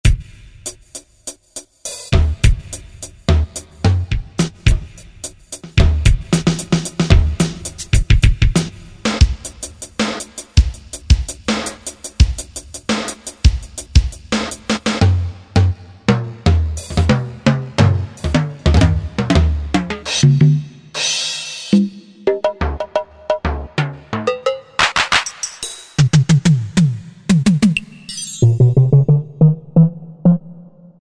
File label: Drums, Korg M1; Factory preset examples